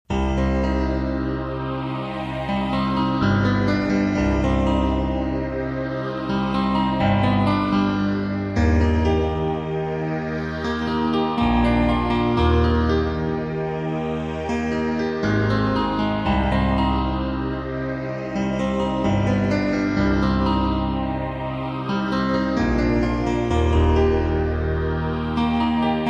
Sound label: S90 ATHMOSPIANO